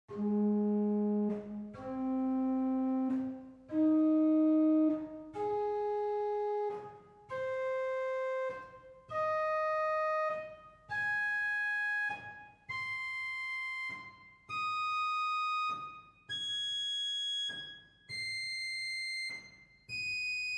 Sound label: Quinta 3 arp, organ stops; Silbermann middle-sized church organ; Reinhardtsgrimma